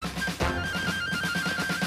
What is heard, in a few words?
Recess Whistle Short